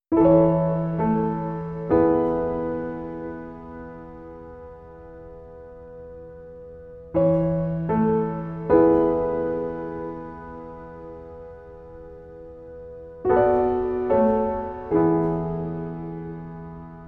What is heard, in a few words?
Piano, Baby Lullaby Piano Sleep Music